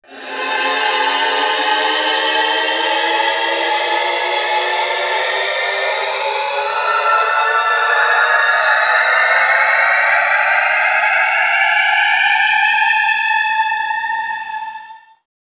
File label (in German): verfall gliss alle